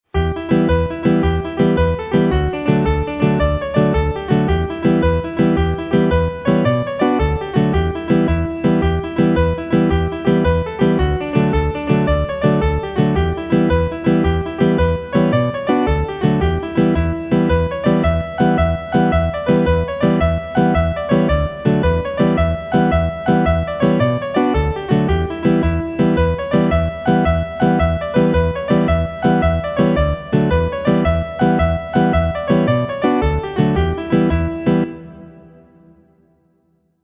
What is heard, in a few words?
swallowtail jig